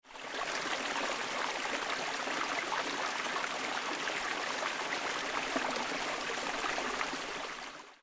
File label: Purling Brook